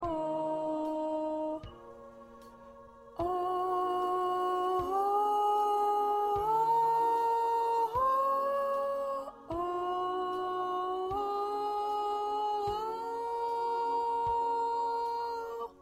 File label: Backup Soprano